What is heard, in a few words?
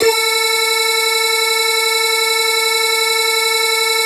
synth clav